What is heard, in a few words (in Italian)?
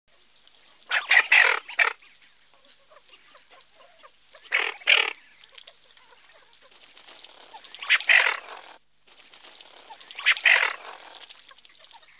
Moretta tabaccata